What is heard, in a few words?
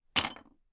tile broken piece down on others; foley